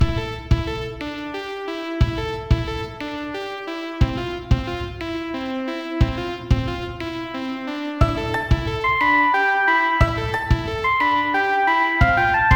MIDI; Furdiburb; Android

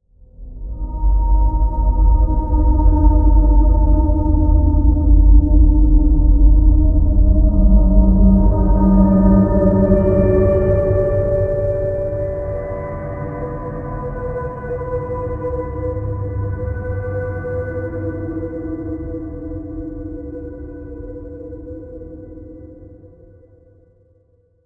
environment stinger synth; Aragami

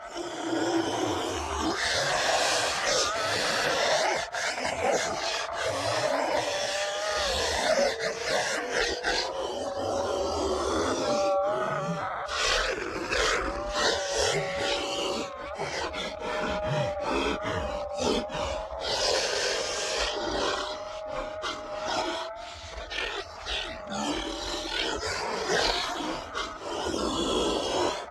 Ghost Movement; Devour; The Town; devour 2021 gamerip pc macos

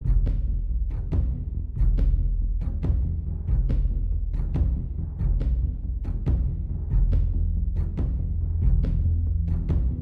loop Tense

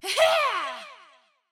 Victory Vo; portal quest android; Mother Nature

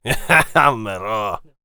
warrior conversation emotion laugh; Aragami

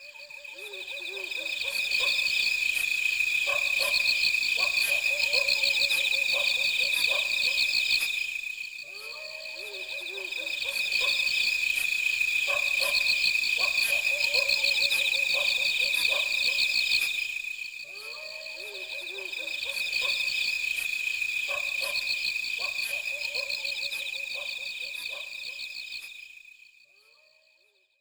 Ambience; Langrisser Dramatic Edition; Saturn

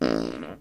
FART; Dino Shift 2

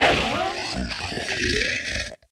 Hellhound Recoil; Puzzle Chronicles; PS3; PSP; X360

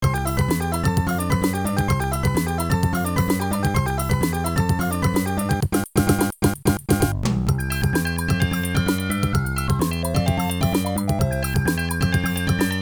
Samba; Bakuretsu Quiz Ma-Q Dai Bouken; Namco NA-1

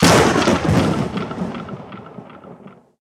Lightning; Puzzle Chronicles; PS3; PSP; X360; SFX SPELLS

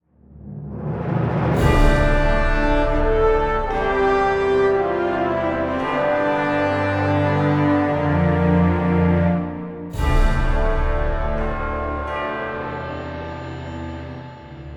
Win Ceremony Oneshot; Toussaint

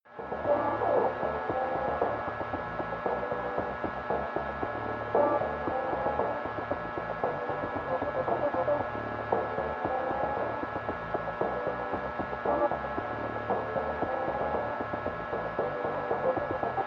Lobby Ambience

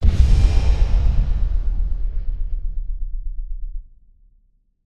warrior light beacon explode; Aragami

